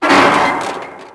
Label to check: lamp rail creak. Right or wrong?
Right